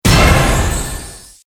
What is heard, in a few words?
UI Big Win; Fruit King; CQ9